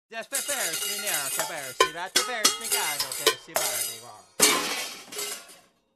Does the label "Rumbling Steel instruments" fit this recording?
Yes